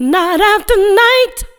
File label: NIGHT AFTER, Funky Vocals, SHOUTS; USB Soundscan vol.18